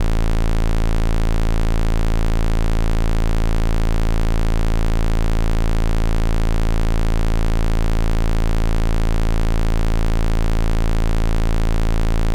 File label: Osc output SQUARE; modular; ac-coupling